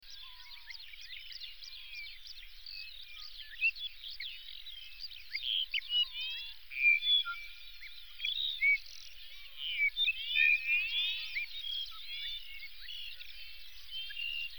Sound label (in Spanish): Alondras en concierto, sonidos de la naturaleza